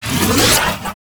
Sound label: Archon Whip Block Start; Enemies; Weapons; Warframe; The New War; Nira